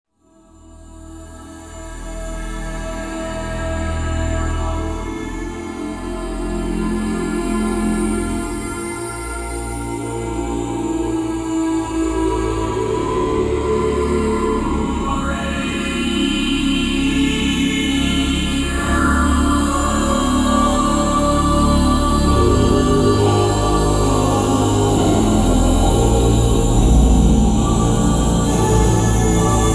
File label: Super Choir